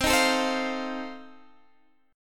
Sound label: F 7th C